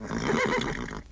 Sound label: Horse General Snort